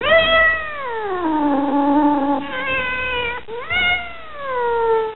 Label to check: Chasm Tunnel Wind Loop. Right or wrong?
Wrong